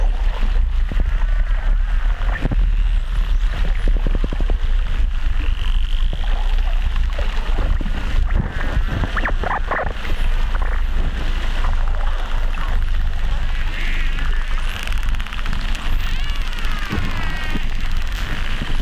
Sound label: Stenella attenuata Martinique